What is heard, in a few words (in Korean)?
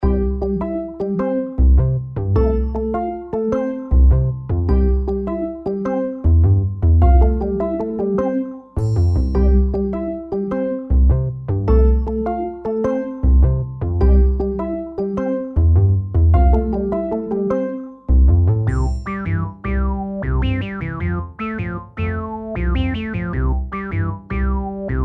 엉뚱한, 황당한; audio sound bgm pack